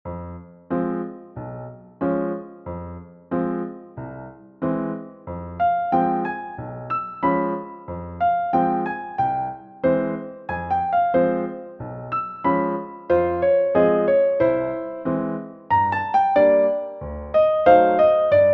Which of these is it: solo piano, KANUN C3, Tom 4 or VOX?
solo piano